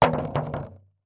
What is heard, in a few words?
foley plastic container small thin lid down on surface close up; zapsplat